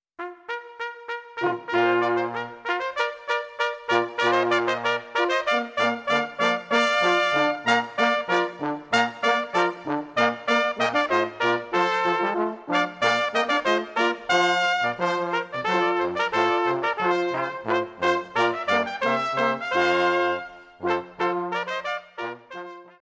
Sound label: Music from Royal Fireworks